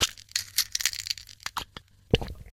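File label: inventory pills; STALZONE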